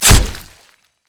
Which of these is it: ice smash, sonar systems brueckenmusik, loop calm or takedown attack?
takedown attack